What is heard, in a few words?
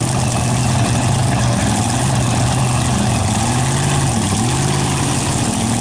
Monster Truck; Learn to Fly 3